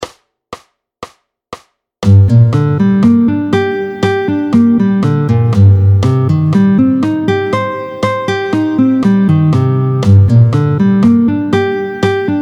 Arpèges mineurs Solm Dom. Vite, tempo 120; arpèges au médiator; Exercice chapitre 8 page 40

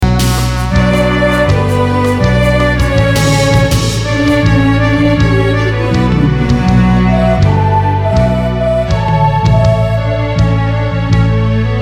Violons et General, Violons dans l’arrangement; Strings, violons; Ils font leur entrée sur le premier refrain (mesure143)

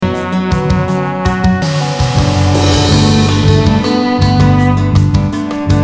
Cymballes, Cymbales dans l’arrangement

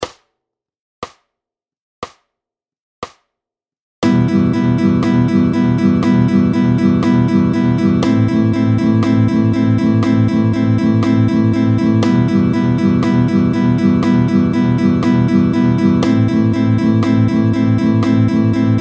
Doubles croches 60, Doubles croches, battement tempo 60

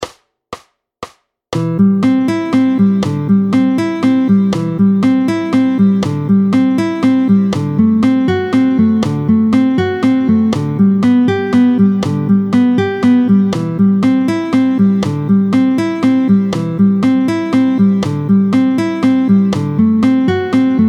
wave Vite 120, le picking les arpeges aux doigts

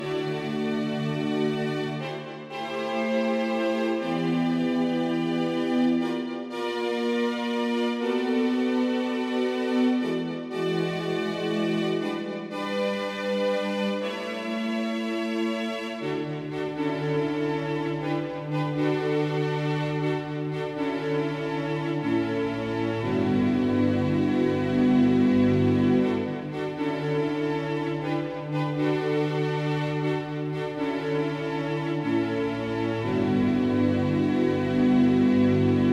Violons

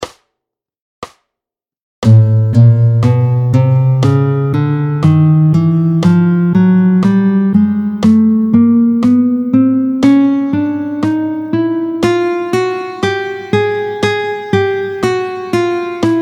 Le crabe tempo 60, picking

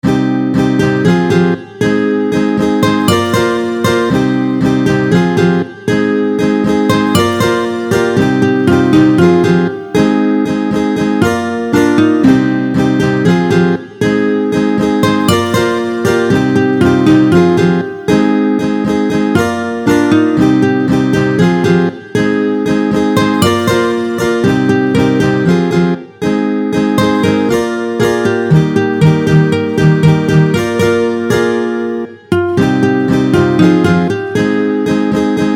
Guitare

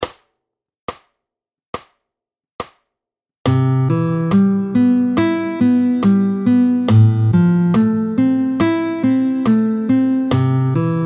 La houle tempo 70; 16-03 La houle, tempo 70; Le I IV V majeur, le I IV V mineur, l'interprétation manouche

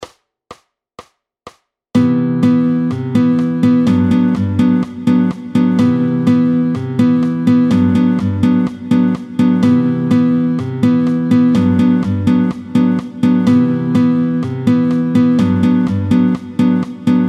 guitare Samba

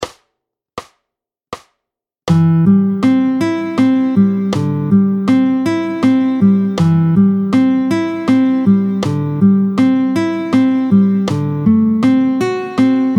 wave tempo 80, arpeges

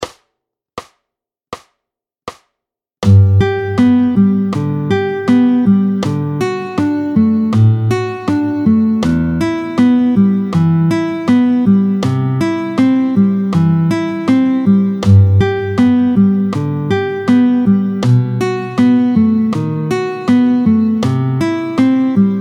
Swell tempo 80, arpeges

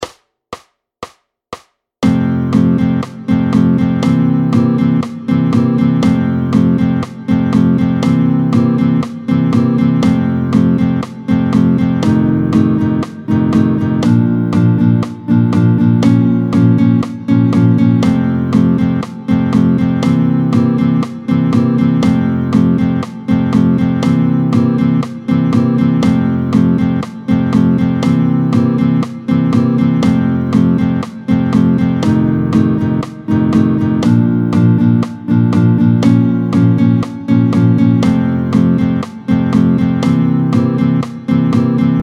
Vite, rythmique tempo 120

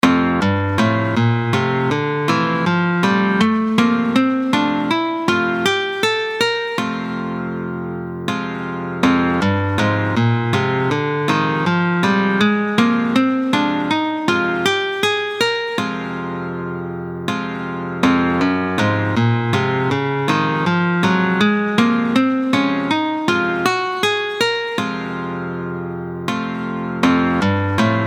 Doigtes des 7 modes

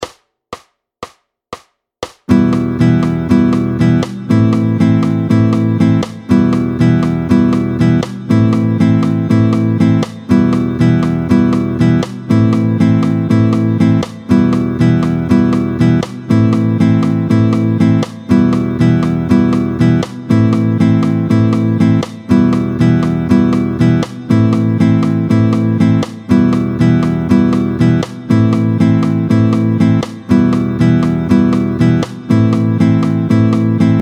Vite Croches contretemps 120, Vite, les croches à contretemps, battement tempo 120

